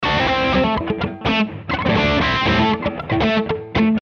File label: 120 Funky Rhythm Rock Solid A